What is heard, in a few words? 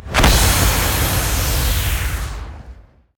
big Win Text; netent; butterflystaxx